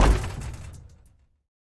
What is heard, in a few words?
ladder hard land; Original TK Sounds